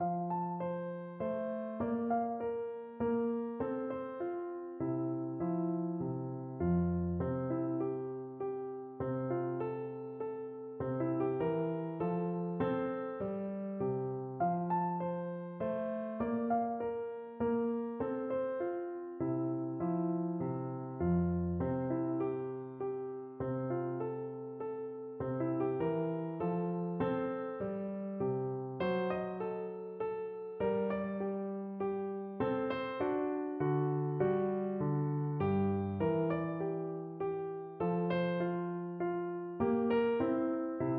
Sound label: minuet, piano